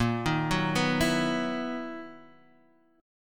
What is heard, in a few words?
B Major 11th Bb, guitar